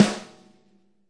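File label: snare, Multisampled Real Kit; REAL LIVE KITS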